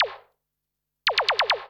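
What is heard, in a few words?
SNARE ELECTRO 140, Techno Drum; Techno2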